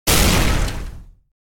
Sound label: Blast, truck loader; coolmathgames